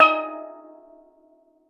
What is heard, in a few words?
STEEL DR; E-MU Producer Series Vol. 7 – Old World Instruments